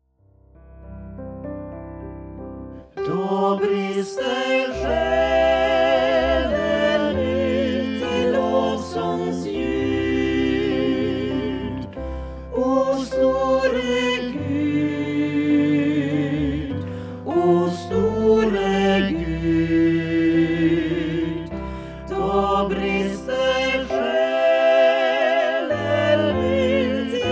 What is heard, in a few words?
SATB; O store Gud